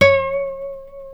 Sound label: D 5 nylon, Guitars